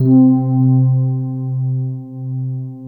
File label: MELODIE C3